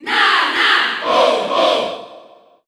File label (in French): Cheer French; Ice Climbers; SSBU